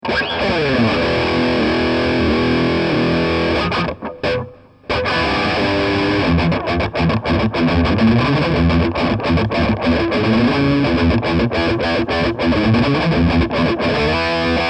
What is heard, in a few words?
High Gain Rhythm